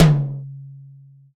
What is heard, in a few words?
high floor tom drum one shot d key